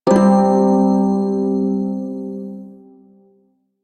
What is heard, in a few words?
Prime Shawzin Chromatic Chord A; Music; Warframe